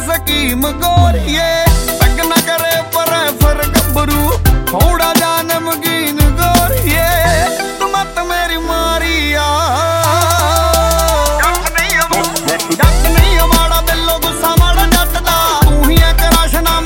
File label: Ringtone Punjabi